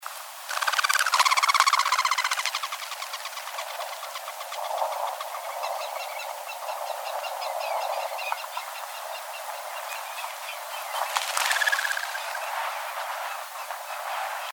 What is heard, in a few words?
Palomita Dorada, Metriopelia aymara, Golden-spotted Ground-Dove; cantos